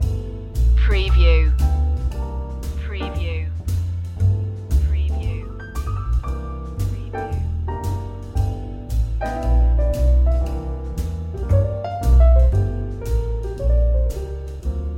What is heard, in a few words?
muddy water loop